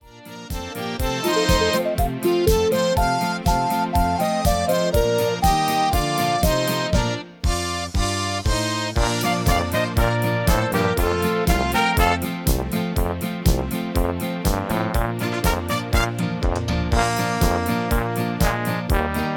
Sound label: MIDI